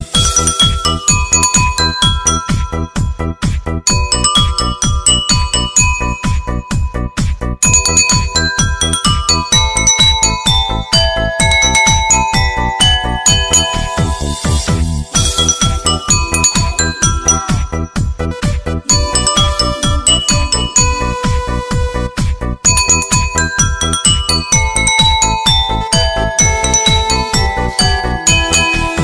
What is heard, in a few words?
Ringtone